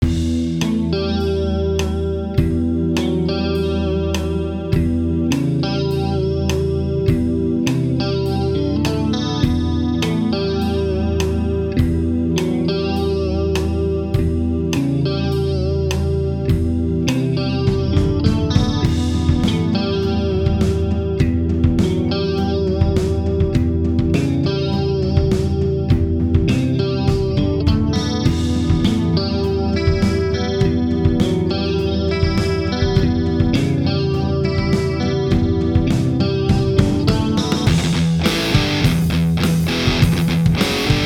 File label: Chorus